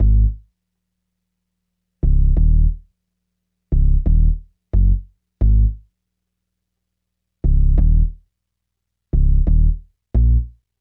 Hip Hop and R&B Drumloops

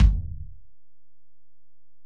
Ac BD, Alesis DM-PRO; 300 Drum Machines

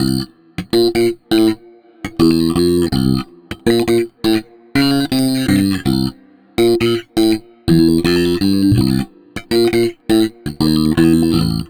Bit Reduced Bass, Electric Bass

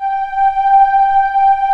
STRING PADS; Pro-Rec - Synthsonic

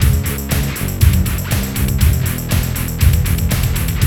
FAT ROCK

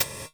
METL GT, DRUMS EFX

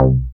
BAS, Eurotech; Sounds Good

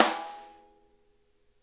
rim mf L, snare; Sonic Reality; Interactive Drum Kits

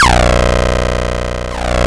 C2, synth; 90 s Sample CDs; NiceBeats; SynthLine VOL-29

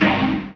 Fm Dub Chord

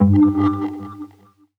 synth note particle low G; Virtual Riot; Synth Notes & Chords

